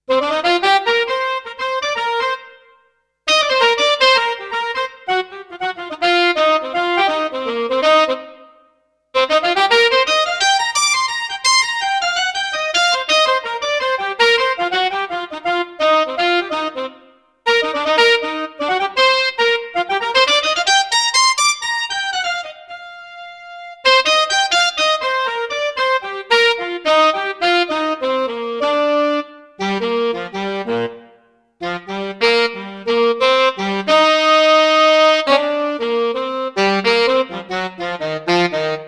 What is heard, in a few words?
Kurzweil SP7 Saxophones Ensemble